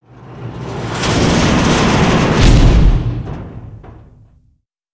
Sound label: Dark Reveal Pathway in